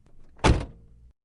close door

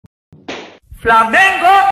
flamengo, horns

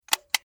car light

